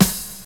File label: treble heavy snare drum sound f sharp key